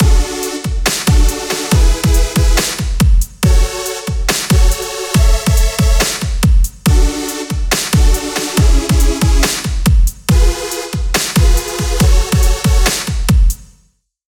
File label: sample song side chain compressor off